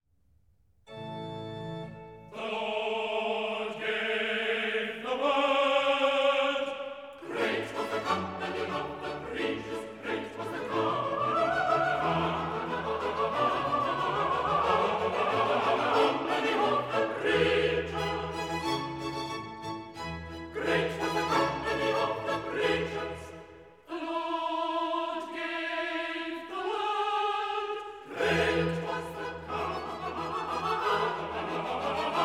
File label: Chorus The Lord gave the word; Handel; Messiah, HWV 56